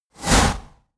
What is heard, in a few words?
swoosh